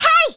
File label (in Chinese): 女人嘿哈声; renlei